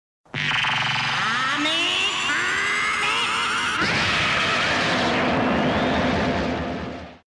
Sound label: kamehameha sound effect; goku